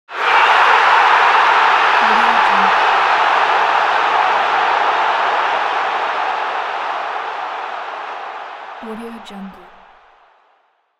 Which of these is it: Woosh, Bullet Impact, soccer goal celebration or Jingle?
soccer goal celebration